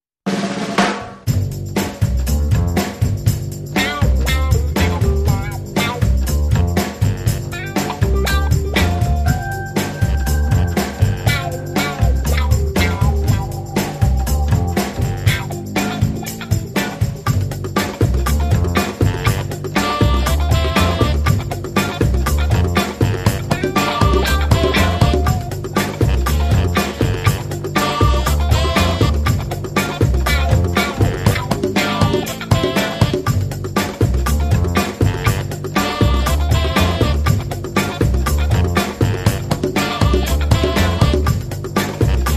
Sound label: No Solo Trumpet